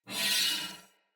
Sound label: Dagger Whoosh Accent Heavy Long, Swords; Weapons; Player; Josalfar